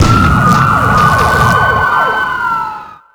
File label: goal; XBOX; Freestyle Street Soccer; In Game